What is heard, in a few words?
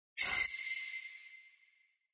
UI comform sound